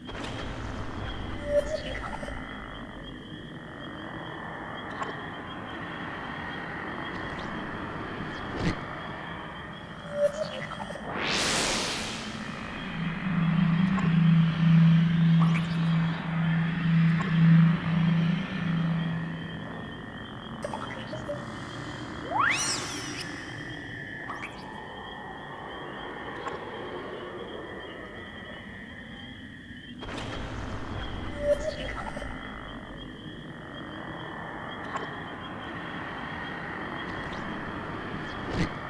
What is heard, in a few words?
(Ambient) The Pirate Stronghold; rayman arena